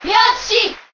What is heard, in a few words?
Cheer Japanese; Yoshi; SSBM